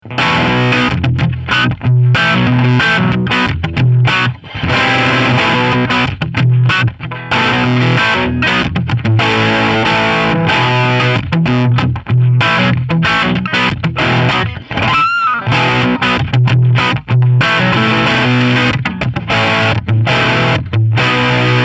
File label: Pentode Crunch